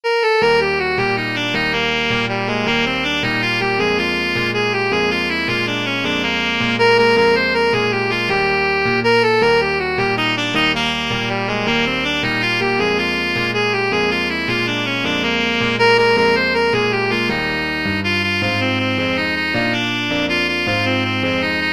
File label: saxophone; mexican hat dance